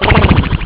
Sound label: horses; ST-XX Amiga Soundtracker Sample Packs